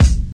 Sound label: 2000s hip hop kick sound g key, Royality free bass drum single shot tuned to the G note.